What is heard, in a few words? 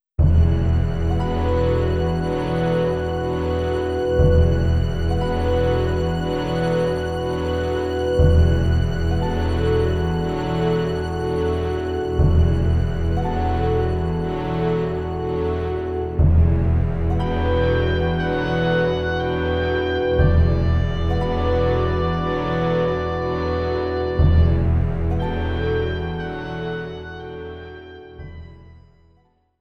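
background music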